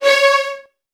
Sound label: C#2, Strings, VN 16TH; ILIO; Kirk Hunter